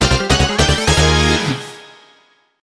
Ooh! Fanfare; paper mario 2 the thousand year door

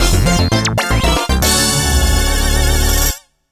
Mission Clear! (Fanfare Only); mario kart wii

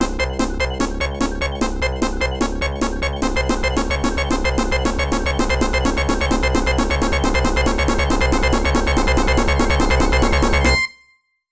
Drowning; sonic the hedgehog 3 angel island revisited pc android gamerip

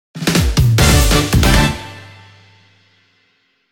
Jingle Quest - Start; sonic frontiers original soundtrack stillness and motion

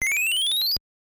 World Start; super mario bros. 3 1988 nes

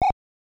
Twirl; super mario bros. 3 1988 nes

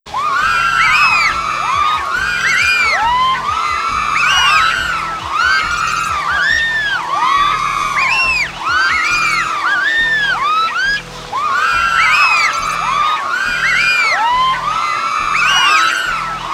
Cheering; Rawk Hawk; paper mario 2 the thousand year door

